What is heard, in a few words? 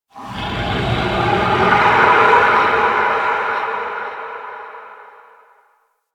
Forest Distant Creature Large; Ambience; Warframe; Grineer Forest